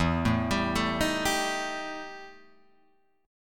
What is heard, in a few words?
E Minor Major 7th Double Flat 5th E Guitar Open G